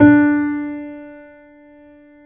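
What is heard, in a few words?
D4, grand piano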